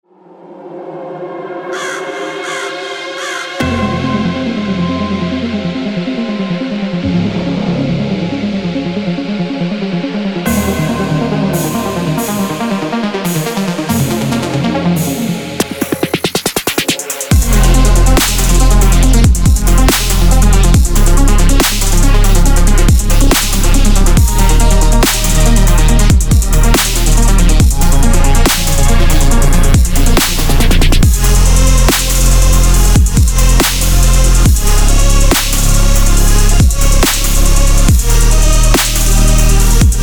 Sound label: witch house